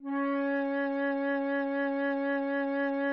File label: flute Db4